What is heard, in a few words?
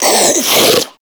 Infested Diseased Ancient Breath; Warframe